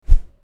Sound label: whoosh